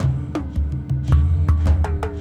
Pot, World Percussion; Roto Toms, Cha, Conga

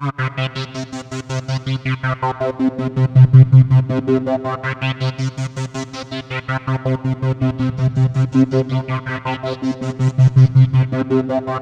Roland JV 2080 LFO C3; MISSION LFO

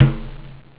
bongo; jazz jackrabbit 2; M8

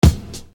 Bass Dr, EuroDance Bdrums

